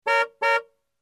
Car Honking Twice

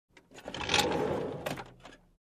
Cabin Crew Cabinet Roll

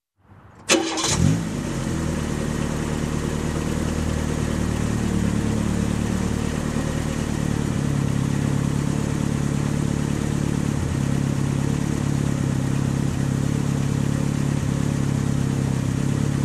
Car engine ignition and running idle

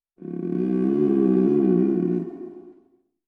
Burp like vibration sound

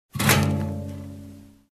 Metal Tank hit

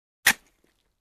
Lighting match

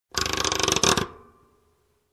Grandfather clock repair

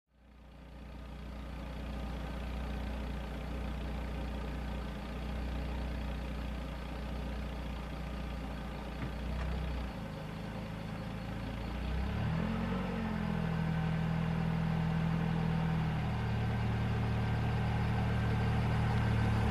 Old car approaching and parking

